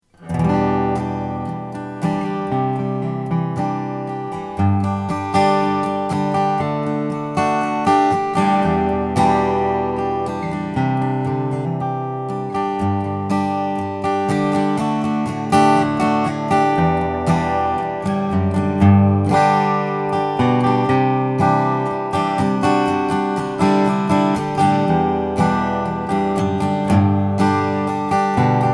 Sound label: rainsong graphite smokey hybrid